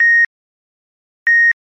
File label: Heart Normal